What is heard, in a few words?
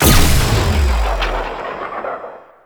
Heavy Orbital Strike Cast; Abilities; Warframe; Tau; Dax Heavy